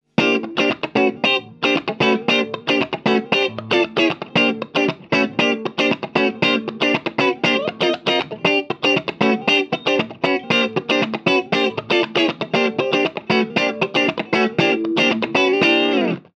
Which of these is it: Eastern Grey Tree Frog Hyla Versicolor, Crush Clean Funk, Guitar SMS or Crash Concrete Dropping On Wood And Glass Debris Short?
Crush Clean Funk